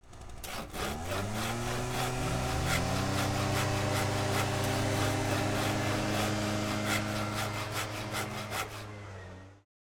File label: Environment - Deforestation Trees Being Cut Down; Varazuvi; Natural Environments; GDC Game Audio Bundle